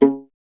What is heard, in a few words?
viola C0, Solo Instruments; Viola 2; Miroslav Vitous